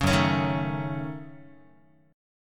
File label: F sharp Minor 6th F sharp Guitar; strummed; JGuitar